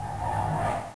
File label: dust skid; madcars2; radicalplay